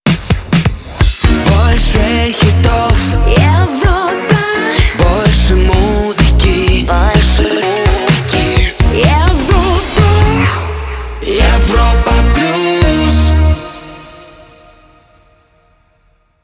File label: Jingle; Europa Plus 2022